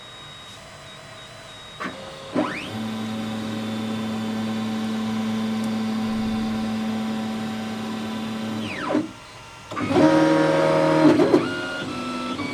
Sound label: print, 3dprint